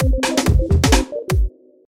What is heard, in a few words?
bass